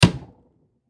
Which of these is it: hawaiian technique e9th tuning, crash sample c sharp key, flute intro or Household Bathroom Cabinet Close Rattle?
Household Bathroom Cabinet Close Rattle